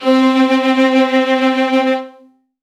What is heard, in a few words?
epic cinematic staccato strings